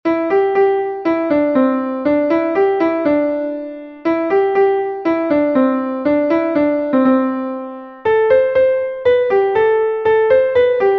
Largo from Symphony No. 9, Classical Piano

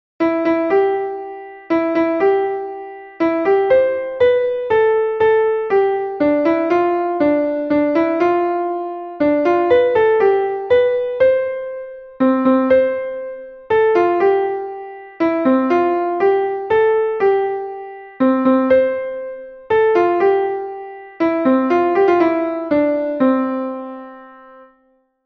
Lullaby, classical piano

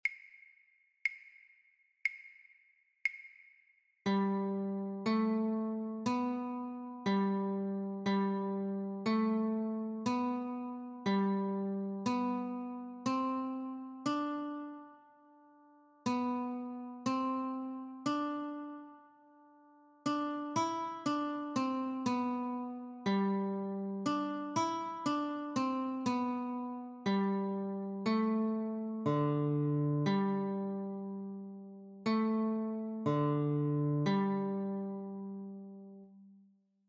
Notes only, guitar

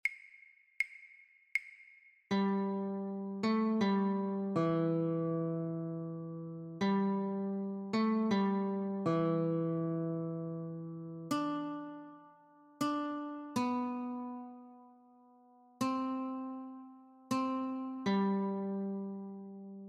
Notes, guitar; Silent Night; christmas

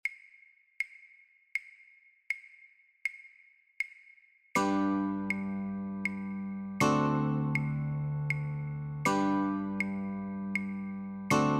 Chords, guitar; I Saw Three Ships; christmas

